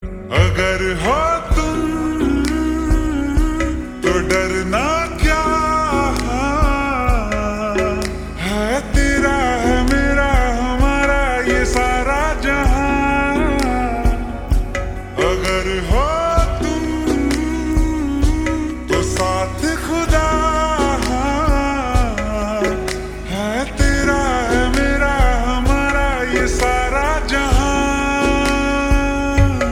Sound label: Ringtone Slowed Reverb; mr mrs mahi